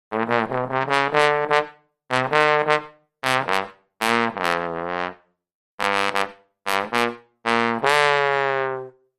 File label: Drunken Trombone